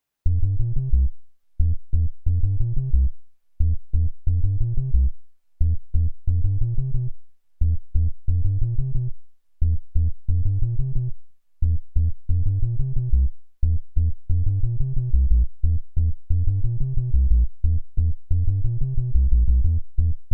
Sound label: MFB Tanzbaer Synth Bass